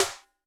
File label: Cap Rim, Yamaha DTXpress-II snare